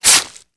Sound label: knife slice; weapons; melee; Modern Warfare